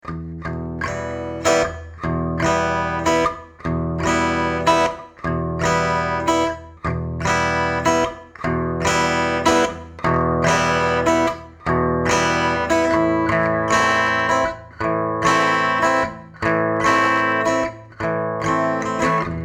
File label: Em to Am Strumming